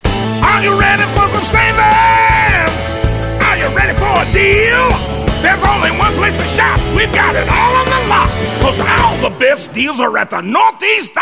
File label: jingles